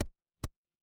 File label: impact bounce